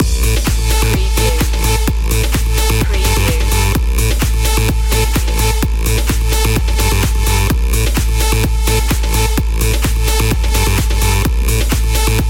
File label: party drop loop